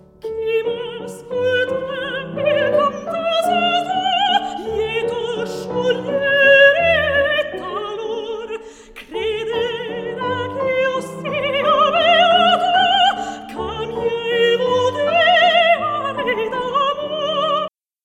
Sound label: piano sop